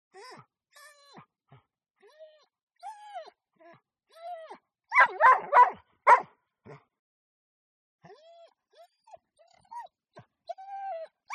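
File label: DOG German Shorthaired Pointer Bark Whine; ANIMAL; smartsound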